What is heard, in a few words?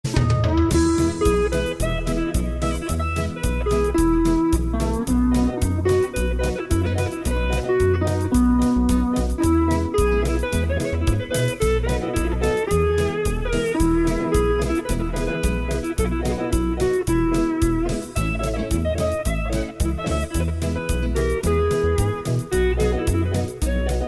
March; Hilo